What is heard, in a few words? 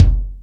Lotsa Kicks, Drum Machines E-MU Pro-Cussion